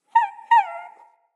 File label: Wolf Cub Trapped Call Close; Animals; Wolf Cubs; Orengal Wolf Cub B